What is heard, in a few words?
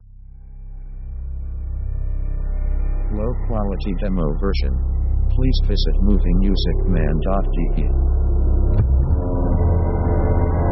thriller ambience; dark; Dark mood